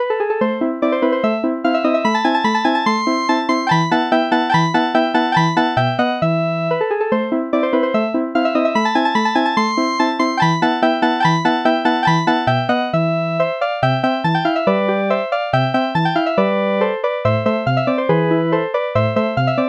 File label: synth bass